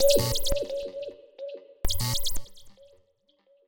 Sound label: Space Echos 130BPM; sci-fi; Loops